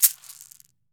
CAXY, CAXIXI

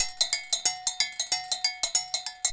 bulu grooves; Best Service; World Colours

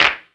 Dark Clap; EMU MoPhatt

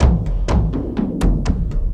Big Drum Thingy, Frame Kendang; Sonic Foundry (Sony Creative Software) - Friendly Fire; Drums

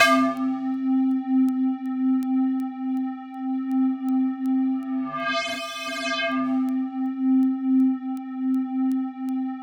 C3 FM; 90 s Sample CDs; NiceBeats; SynthLine VOL-20

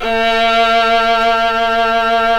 Synclavier Strings; ILIO

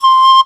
BAMB SH, BAMBOO FLT

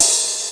Opn Hihat, Technics AX5

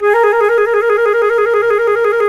C flute trill

